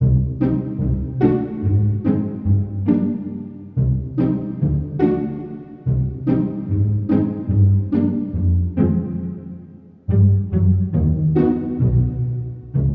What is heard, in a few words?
Bari Sax; simpsons hit run ps2